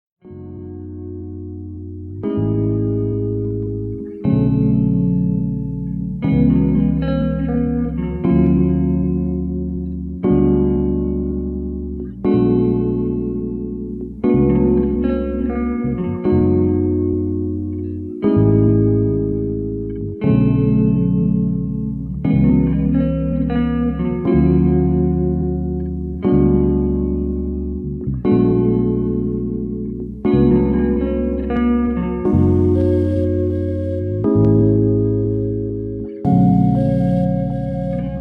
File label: 432 hz guitar chill